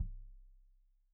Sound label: Kik Stereo; Deeply Sampled Drums